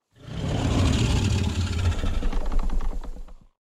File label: roar small; fatalis